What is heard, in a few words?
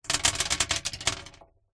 foley stones small gravel drop handful on empty wooden box lid recorded inside